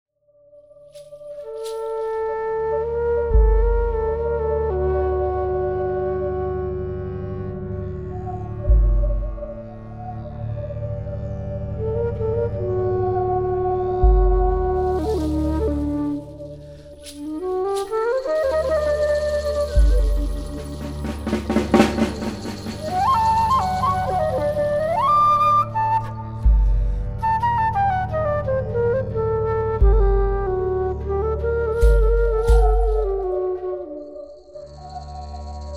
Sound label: Didgeridoo